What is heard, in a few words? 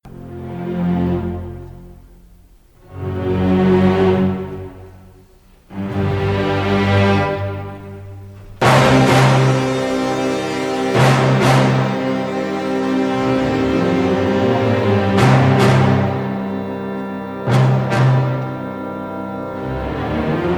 Jingle; Wagner; Gotterdammerung; LarpChess